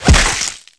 smash cake; hits; Tony Hawk's Project 8; XBOX

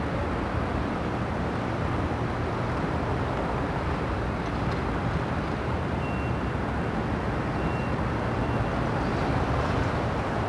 ambience; Street Hoops; XBOX

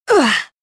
land; tavion

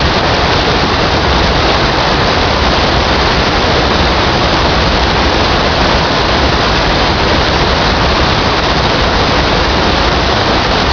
waterfall loop; Chase Hollywood Stunt Driver; XBOX

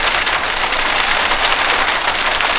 rain windshield; Quantum Redshift

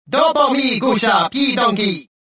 anger

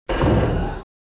start, ships; Quantum Redshift; kutoo

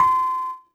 piano c; school; Tony Hawk's Project 8; XBOX; inschool

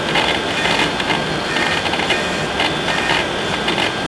ambience computer room; ambient

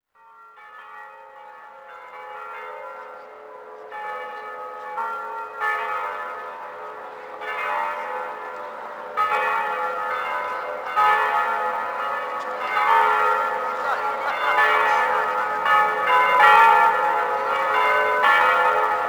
Bells, Ambience; Project Gotham Racing 2

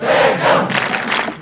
chant, Crowd; beckham; XBOX; David Beckham Soccer

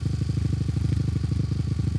idle, Engine; 450

